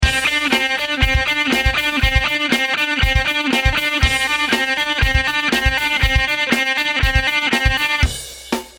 hammering

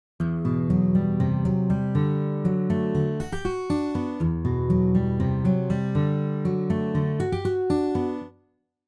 Real Guitar Arp

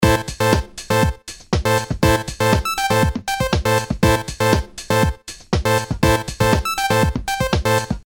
delay 32

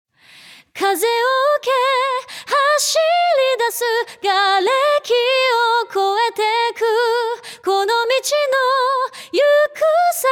Musical

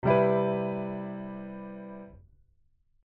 open voicing

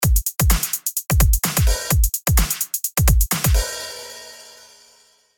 swing

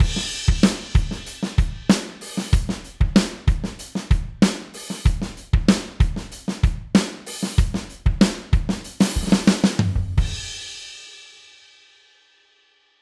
Drums dry

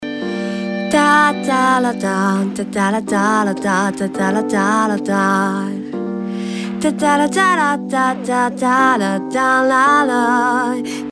delay

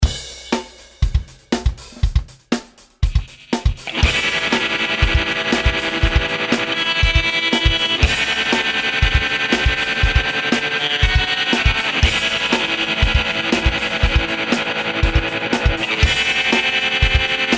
tremolo

